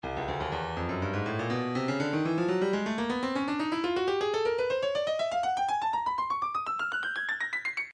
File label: stylus breakbeat midi 122 bpm motown jam